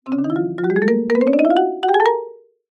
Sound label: cartoon ascend fast fear marimba